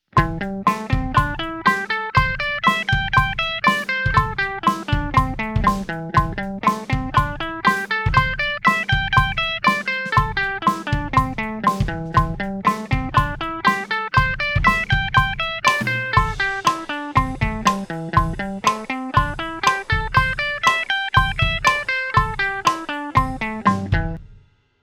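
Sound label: A minor #5 120 bpm eighth, guitar; A Minor Pentatonics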